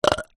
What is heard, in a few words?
human male 37 years old burp